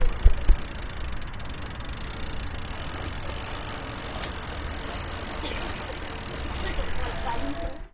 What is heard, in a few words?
cycle chain